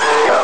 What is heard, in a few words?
monster; Amiga Soundtracker Sample Packs